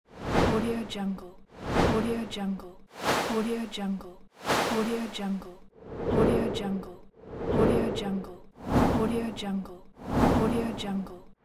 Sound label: whooshes